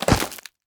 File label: resin Hit, alien